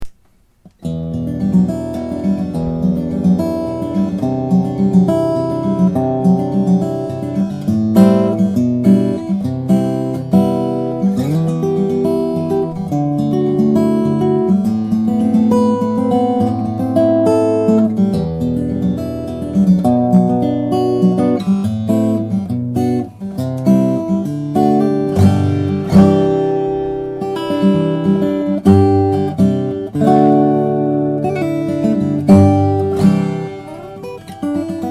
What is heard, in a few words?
Cutaway Maple